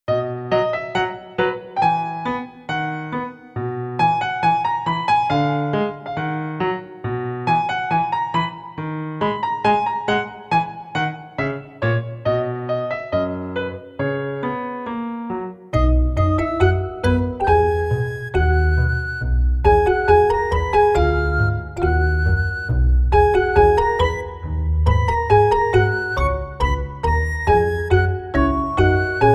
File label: BPM138